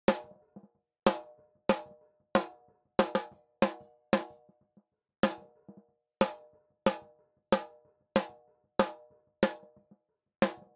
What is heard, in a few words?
Snare High Debleed Long Hold ON